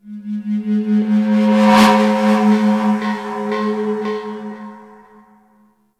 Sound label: Temple Bells Swell; Ambience; Corpus Ship; Temple One